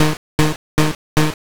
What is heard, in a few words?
chew